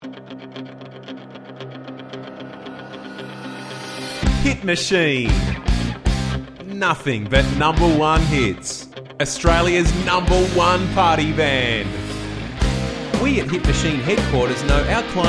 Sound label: Hit Machine